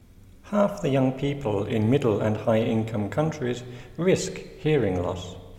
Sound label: loud music